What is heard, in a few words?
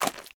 Foot Mud Shoes Walk